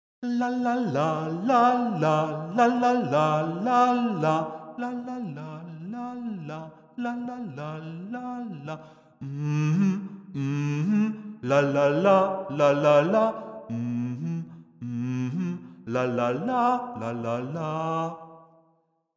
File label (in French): noel basse